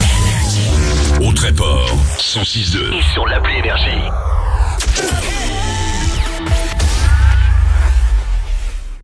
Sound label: JINGLE; LE TREPORT, 106.2 FM; NRJ